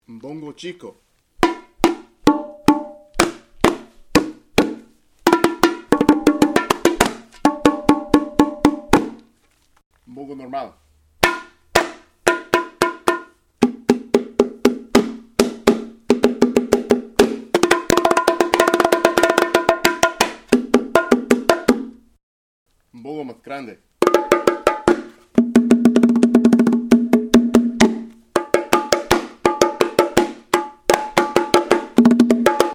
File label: Bongo Sounds